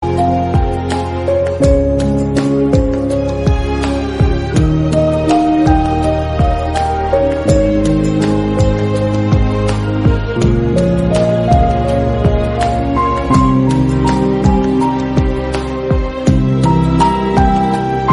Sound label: hindi background instrumental music; aashiqui 2